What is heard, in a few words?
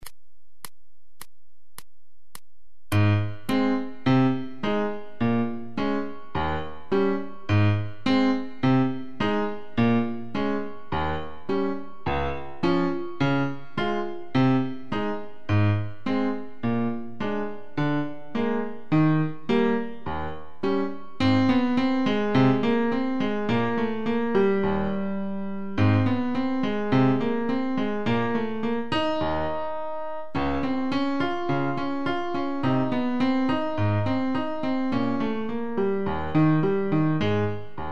Ragtime 105